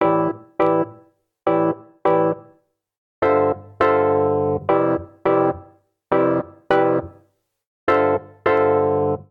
Progression AOR Rock i - VII - VI A Minor 103 bpm, Electric Keyboards; Ableton; Factory Packs